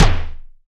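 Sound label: PER PROCU, Procussion, percussion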